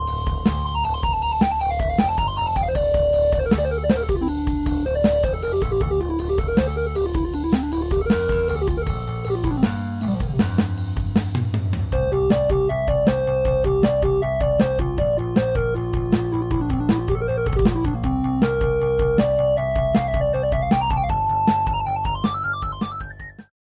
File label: Soft Lead; Waverator Spectra; syntheway